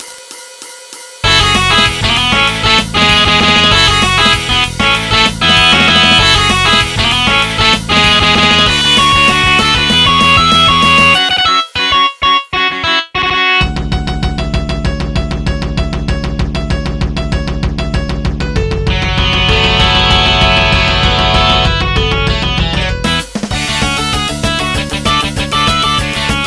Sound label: Guitar